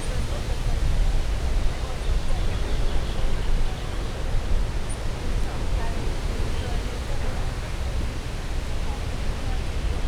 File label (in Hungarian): nagyon halk magas hangocskák, zúgó szél, távolban párzó bengáli tigrisek, ormányos medve; Győri Zoo 2014